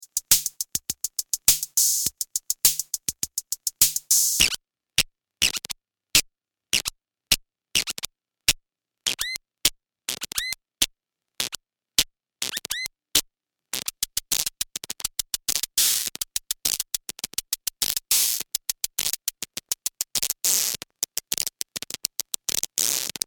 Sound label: gated drums; paralleluniverse